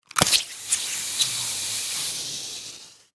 Grapple Gun Fire; Warfare; Miscellaneous Combat; BlastWave

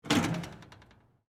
Cabinet Door Open, Metal, Small; Blast Wave

